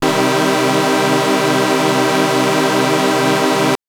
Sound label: Synth SyncPad min c2; TrashLibrary